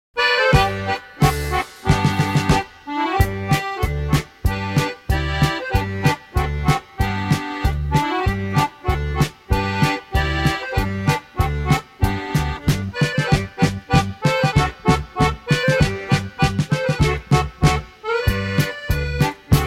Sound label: instrumental